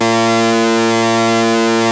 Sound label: ARP 2600 F3, synthesizer; Hollywood Edge - Giorgio Moroder Rare Synthesizer Collection